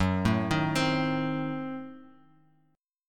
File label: F sharp Diminished 7th F sharp Bass Standard; arpeggiated; JGuitar